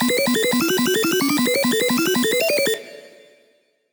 Fill Arp Shiny 175 B

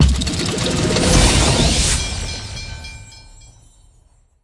Activate Season Pass Thunder; Subway Surfers City; Android, iOS

